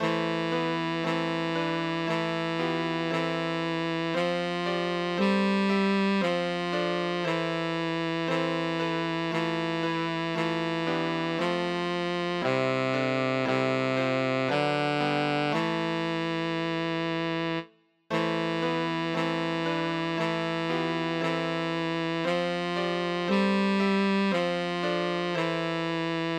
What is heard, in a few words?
virgo dei genitrix midi bass; o marie mere de dieu